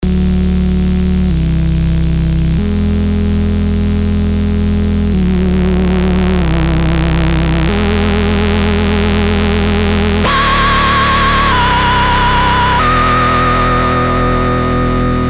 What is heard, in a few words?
Spooky Reese; SERUM ULT BS